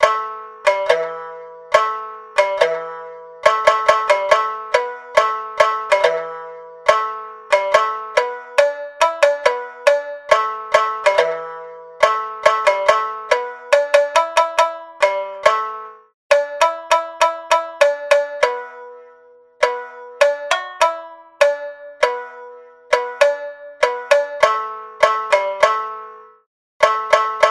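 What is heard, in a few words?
shamisen tataki bachi downstrokes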